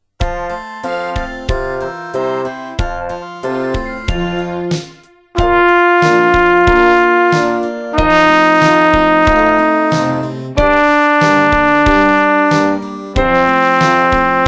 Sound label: scale down